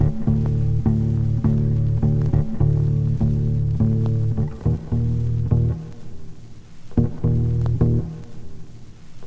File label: bass, Loops